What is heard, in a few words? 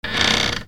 SQUEAKS